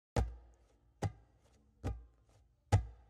Tabla

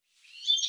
sing finch mouth

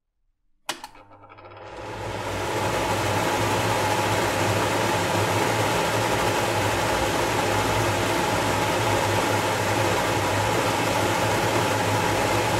Kitchen Hood

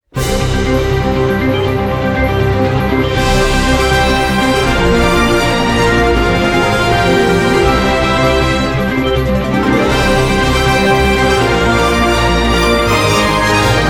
Startup Music; The Wild Robot

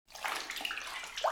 soak fabric, Cleaning floor

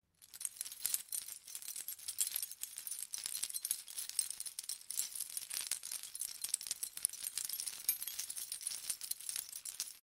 key chain

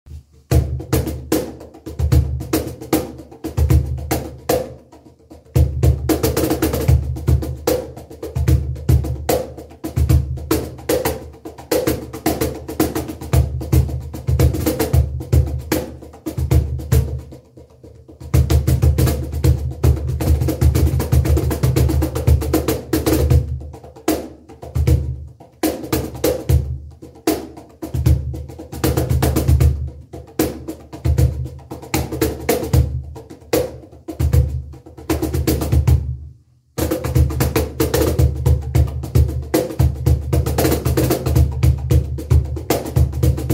Cajon

